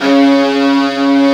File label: C#1, CELLO SECT; AKAI S6000 CD-ROM - Volume 1; Strings